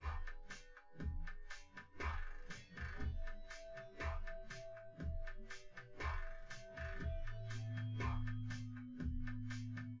wdrums synthconv

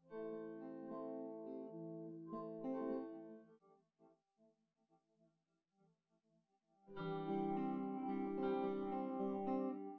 nodrums synthconv

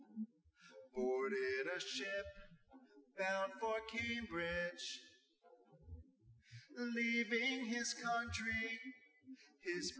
nodrums inst src

